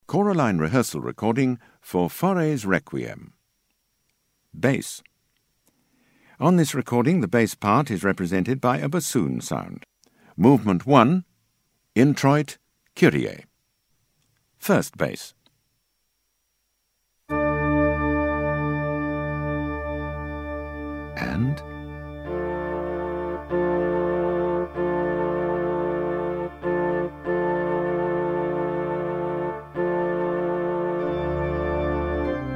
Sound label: Bass; Faure Req